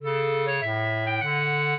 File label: minuet, clarinet; Mozart